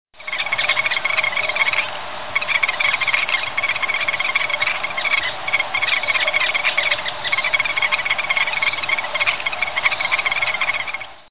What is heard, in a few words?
frog